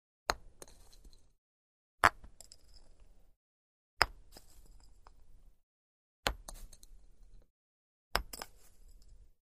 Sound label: Golf Ball Putt Roll